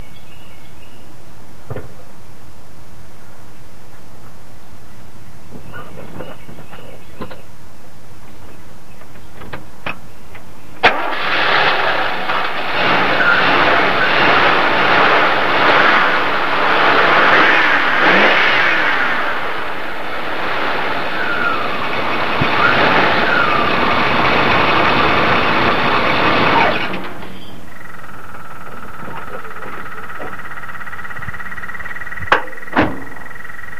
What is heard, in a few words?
car